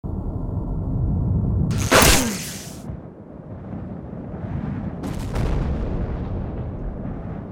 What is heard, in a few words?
Metalbend; gamerip; The Henry Stickmin Collection